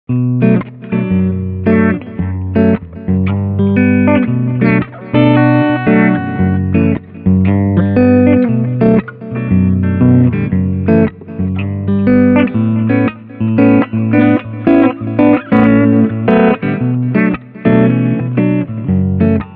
Walking Bass beide Pickups

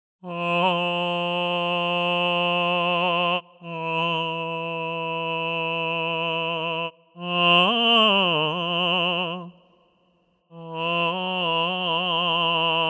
Vocal Legato Ornamente medieval tenor; ERA II Vocal Codex

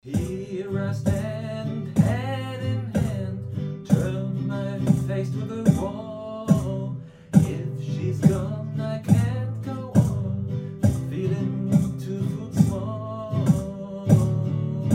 Zylia Praxistest Percussion

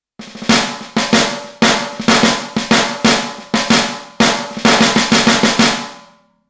Dynamik Snare

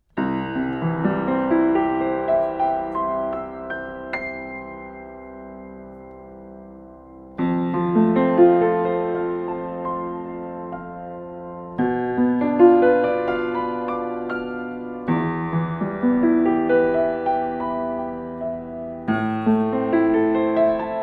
DPA 4060 Piano PZM Offen Deckel

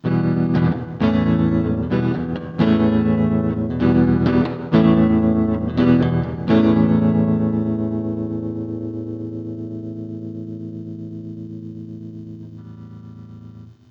iZotope Spire Studio E Gitarre mit Ampeffekt Verb65

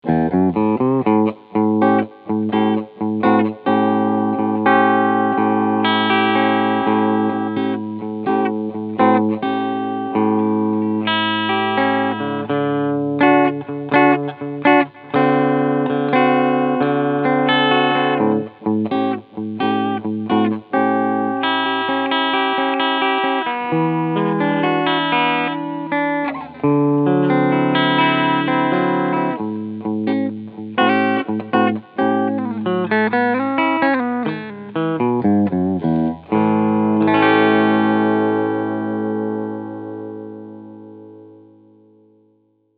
Epiphone Lucille Clean Steg Humbucker; B.B. King

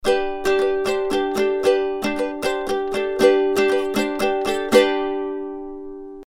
Niere flat Ukulele; Sontronics STC 2

